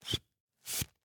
Hand bellows, leather, wood, brass, open, close, wheezy, blown air, supple, creaky; Eiravaein Works; Vaeyan IV